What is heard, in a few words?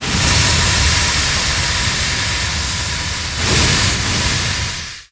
plumes capture; halloween; Garry's Mod; tf2